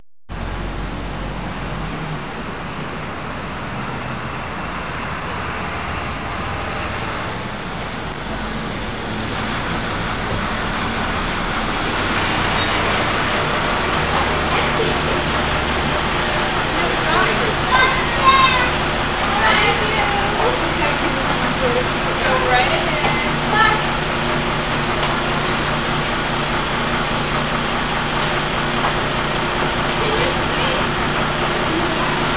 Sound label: baby